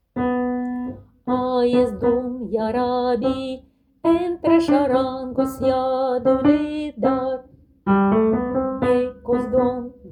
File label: Carnavalito Alto